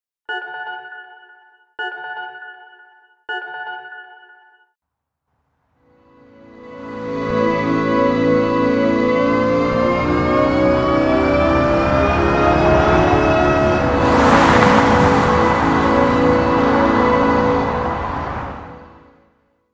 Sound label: Canto Forward Acceleration